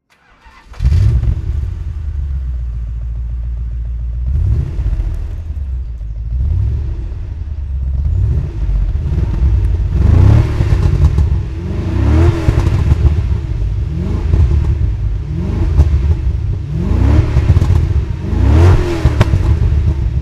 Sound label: aston martin V8 volante throttle